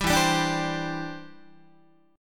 E Minor 7th Sharp 5th E, Guitar; strummed

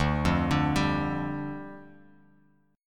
D-Minor Double Flat 5th, D, Bass Standard; Dmbb5; arpeggiated; JGuitar

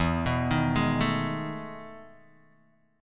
E Major 11th down Guitar DADGAD

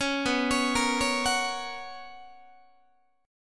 B Major 7th Suspended 2nd B Guitar; arpeggiated; 4A,4E,5A,5D,5G#,6C#; 16,19,16,20,17,17; JGuitar

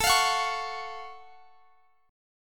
F sharp Minor Major 7th A

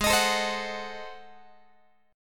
G sharp 7th Flat 9th, Guitar; strummed; chord

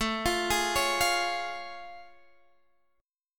A Augmented Major 7th A, Guitar Half Step Down

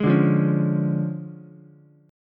D Minor Major 7th Flat 5th Ukulele, chord; 1,2,1,4; DmM7b5 strummed; JGuitar

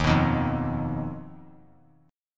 D sharp Suspended 2nd Sharp 5th D sharp; strummed; JGuitar

